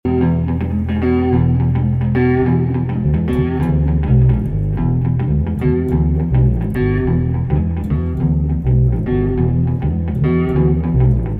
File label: Short Riff